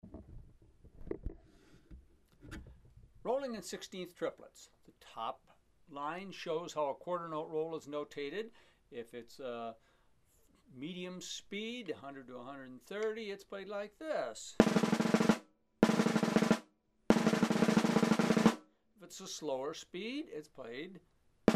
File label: rolling in sixteenth triplets